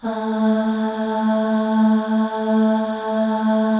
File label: AH FLAIR, FEM; Spectrasonics; FEM.2 AHS